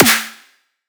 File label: Snare